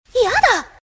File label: jump; sven; player; Miku verano